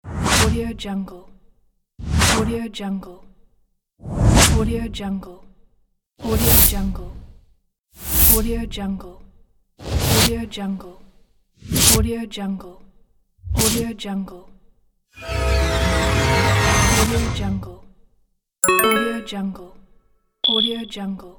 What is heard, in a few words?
game pack